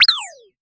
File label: collecting a diamond soun, collecting